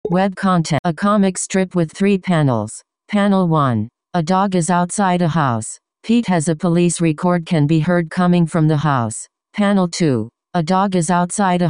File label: angriest